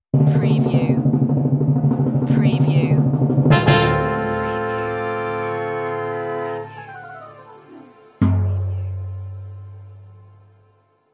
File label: cartoon superhero fail